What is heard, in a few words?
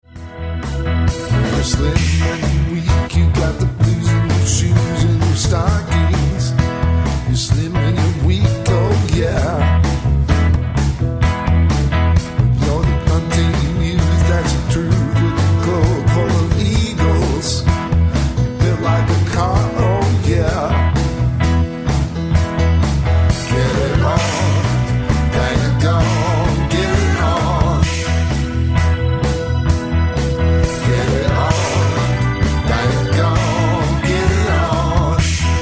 gong